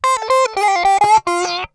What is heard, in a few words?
SQUEEZER, Funk Guitar; 90 s Sample CDs; Zero G